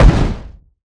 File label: exposion enemy short; CrossCode; battle